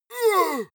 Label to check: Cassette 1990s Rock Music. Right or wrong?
Wrong